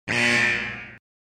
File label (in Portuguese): alerta ruim